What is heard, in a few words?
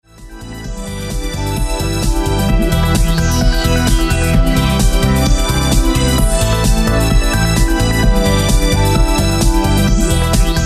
dance music